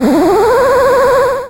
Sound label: horse